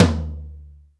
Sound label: DRY T, drum; Crypton; DrumBase5000